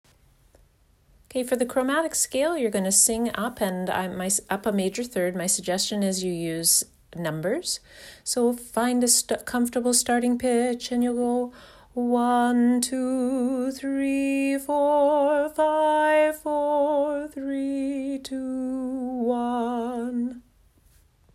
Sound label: Chromatic scale Exercise